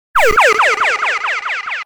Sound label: Echoes, ringtones